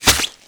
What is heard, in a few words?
Axe Scale, Damage